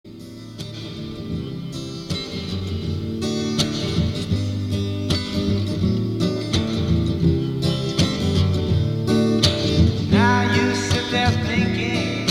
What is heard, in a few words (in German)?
Musik; Attac; LoRa